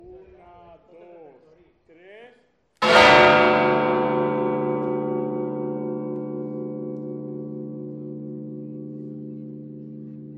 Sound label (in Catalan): campana